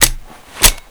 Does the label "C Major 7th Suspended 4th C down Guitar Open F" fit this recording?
No